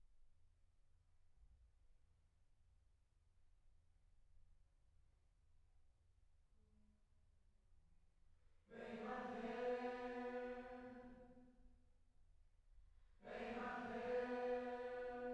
Choir

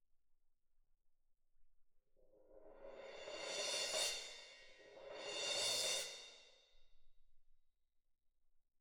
Perc

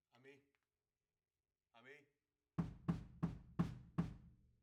Overheads

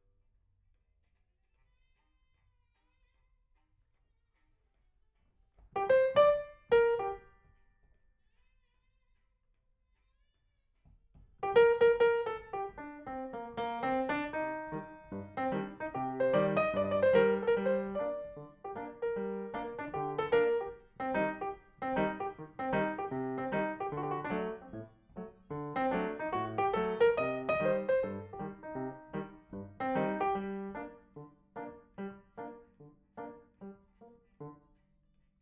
PIANO

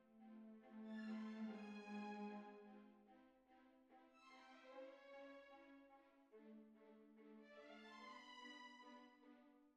Cbasses

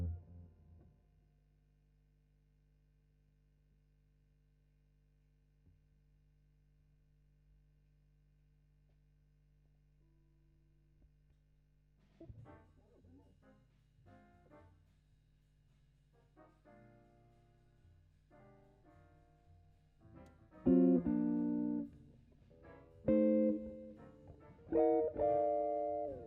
GTR B

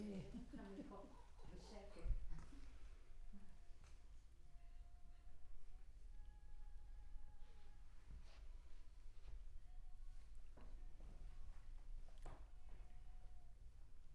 Center u47, Choir

